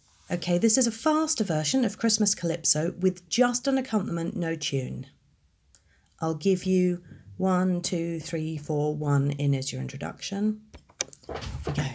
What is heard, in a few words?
christmas calypso accomp only